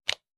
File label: electric drill trigger